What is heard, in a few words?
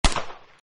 gun sound; Arc of Templar